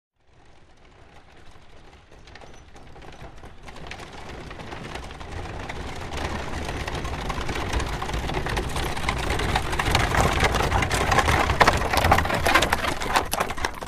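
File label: Carriage Up Stop Start, carriages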